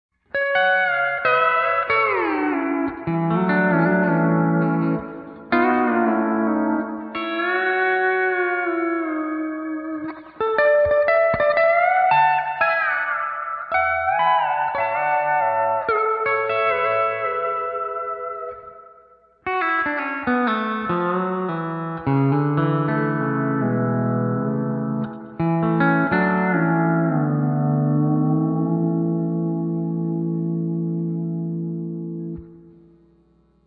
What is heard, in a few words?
Spring; ToneX Pedal; Spring Reverb